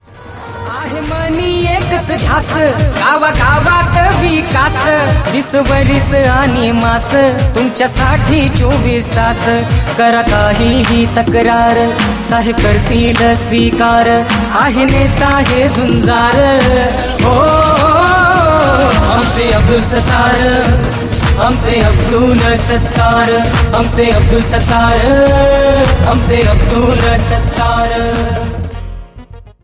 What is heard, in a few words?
Song ringtone